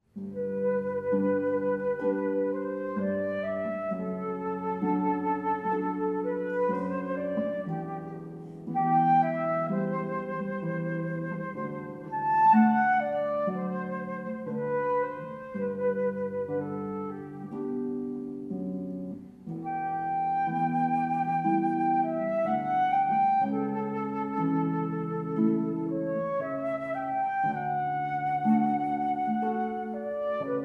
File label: Arioso Flute And Harp; Classical